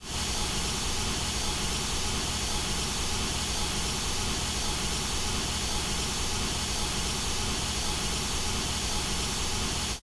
water pipe; Storage Hall

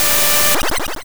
Spider Web

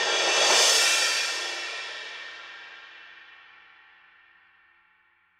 Music Boss Hideout transition DRUMS; Sifu

